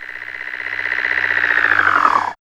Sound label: insect